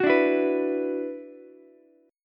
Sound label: F sharp 7th Suspended 4th Sharp 5th F sharp down Ukulele; F#7sus4#5 strummed